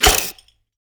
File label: toss; LoL; jinx base